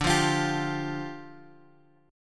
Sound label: D Minor Double Flat 5th D; Dmbb5; {X 5 3 X 6 3} strummed